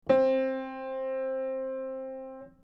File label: piano